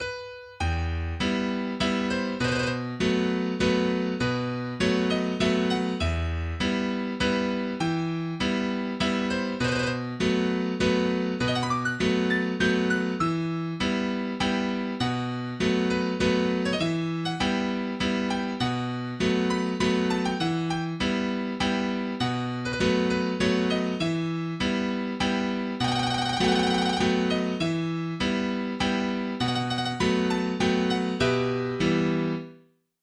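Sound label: Mini Waltz for the children, MIDI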